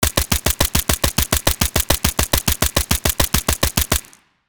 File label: M240B machine gun automatic fire